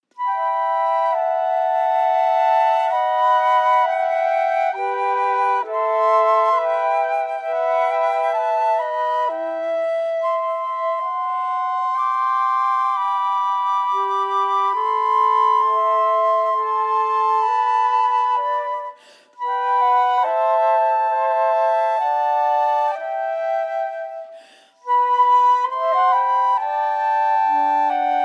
Adagio, Chamber Music for 3 Flutes, complete version; Corelli